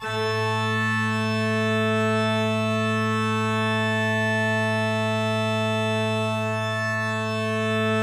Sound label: organ